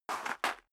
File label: step high heels gravel light scuff; schritte; Footsteps; menschen